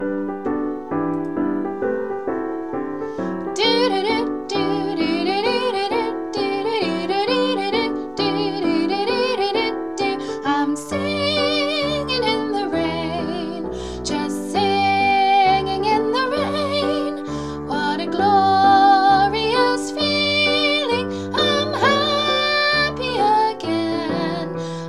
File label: with vocal